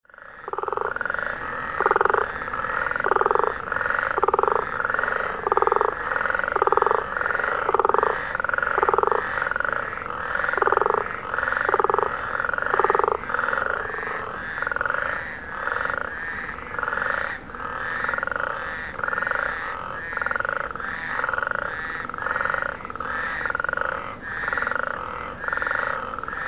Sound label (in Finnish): sammakot